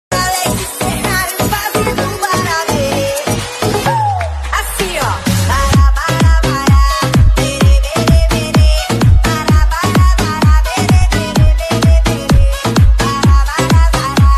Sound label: Mobile Ringtone